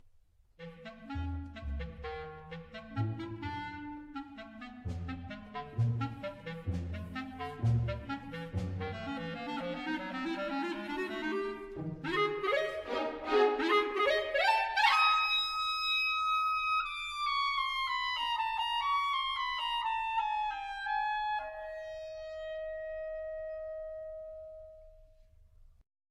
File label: Clarinet